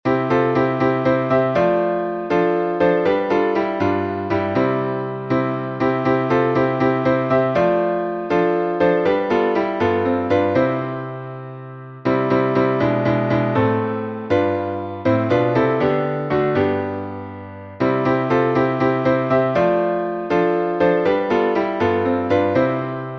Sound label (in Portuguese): salmo playback